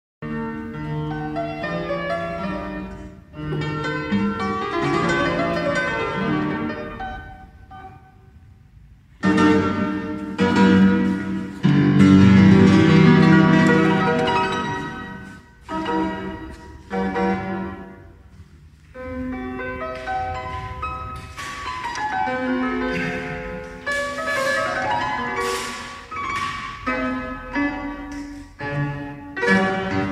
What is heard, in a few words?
FORTEPIANO